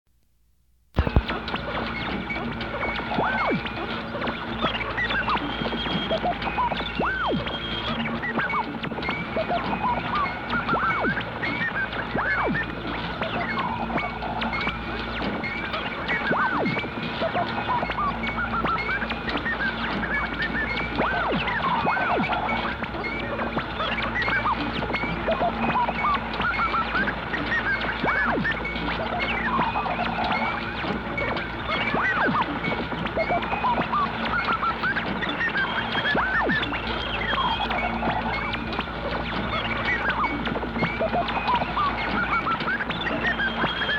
communications static; TOS

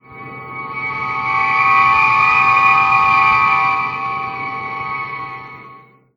transporter

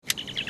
communicator; ENT

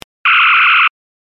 ship phaser

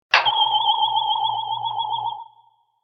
scanner, computer

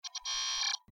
computer error

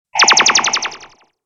stun; tos; mratoz

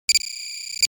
computer beep

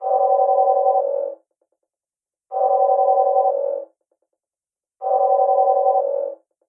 red alert, klaxons

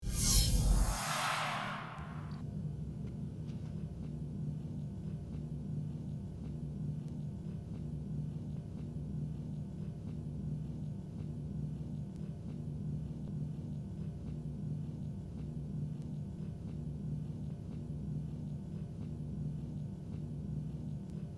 holodeck end program

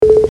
hail beep clean, computer

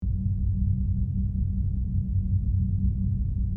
hum clean; background; tng

